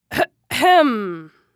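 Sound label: throat clearing, "kkhm"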